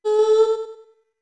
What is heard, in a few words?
Notes Voice A med; OOT